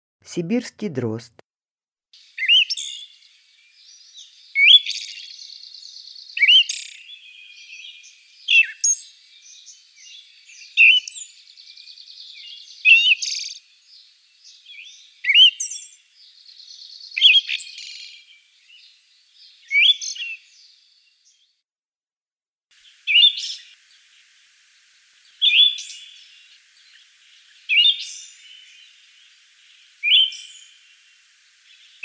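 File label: Siberian thrush voice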